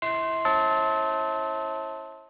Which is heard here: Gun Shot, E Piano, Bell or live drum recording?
Bell